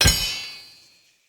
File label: Blacksmith Hammer Strike; Blacksmithing; Friendly; Tuvalkane